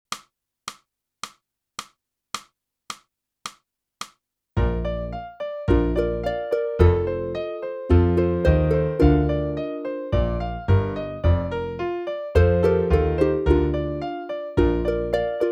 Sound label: clarinet; boosey method